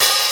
natural open hi hat g key

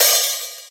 soft open hi hat g sharp key; open hat samples

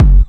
short kickdrum sound F# key

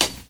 studio high hat sample D key; hits one shots; hat samples

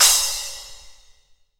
big room drum crash sample a sharp key; cymbal drum samples

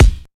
rich high end bass drum single shot F# key

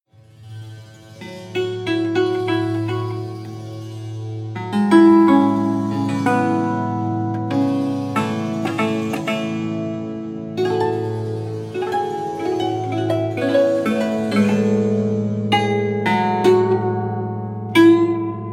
kotamo improvisation sound

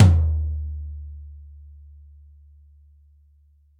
mid tom drum sample e key; tom drum samples

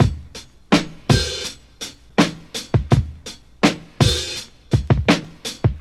83 bpm drum loop A key, kick tuned to the A note

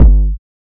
hot kick sound g key; kick drum samples

